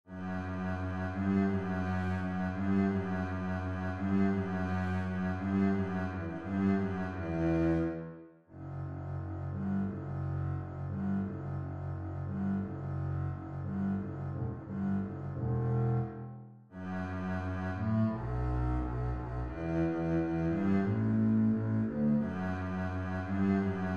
bass melody; fourseasons autumn

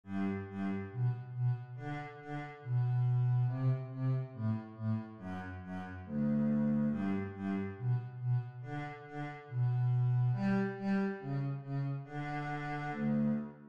bass, string bass; surprise

